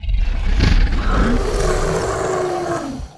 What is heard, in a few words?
ROAR; giants